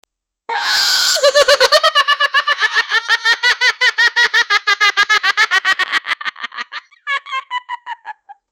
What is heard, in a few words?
witch evil laugh